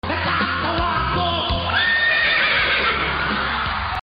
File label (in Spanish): caballo